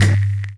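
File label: HH, hi-hat, drum kit; Sonic Foundry (Sony Creative Software); Esoterik Beatz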